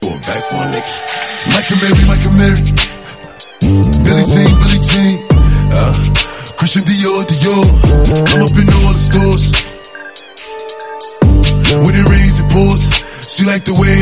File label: boombox